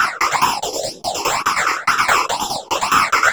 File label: TAMB; Zero-G; Chemical Beats